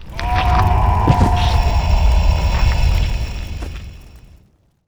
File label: thanatos death, monster